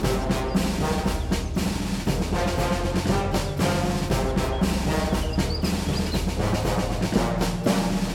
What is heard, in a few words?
Paint Music; GamePigeon